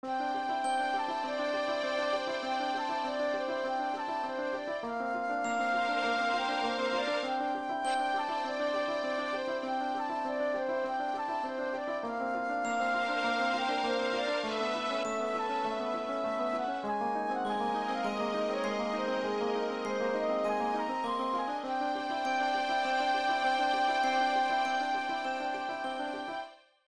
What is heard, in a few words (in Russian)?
muzyka dlya kino